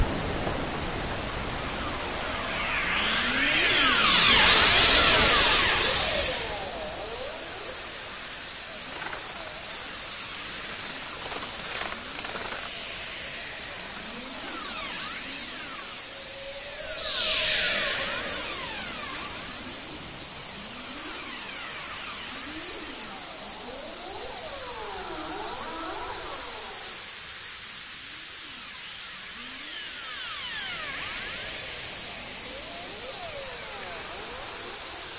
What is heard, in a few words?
ross seal